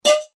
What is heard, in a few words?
Player Thrown Object; The Powerpuff Girls - Mojo Mayhem; NextlevelGames